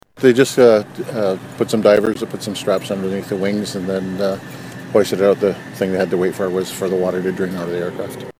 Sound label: plane crash